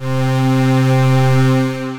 Big Pad; Synth Pad; Santa Monica Big Pad; Ableton; Factory Packs; Synth Essentials

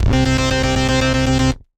Stabbed Bass, Synth Rhythmic; Ableton; Creative Extensions; Racks

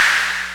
Clapbox Noise; Ableton Foundation FreePacks

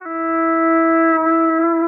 Poly Synth; Press Keys; Ableton; Factory Packs; Inspired by Nature by Dillon Bastan; Emit Presets

